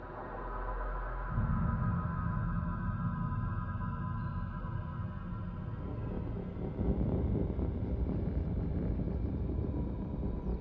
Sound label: Space Soundscape